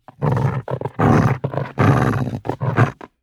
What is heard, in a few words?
agitated dog growling